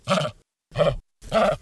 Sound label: threat grunt